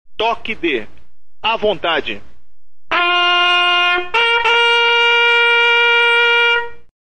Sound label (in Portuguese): à vontade, toques de corneta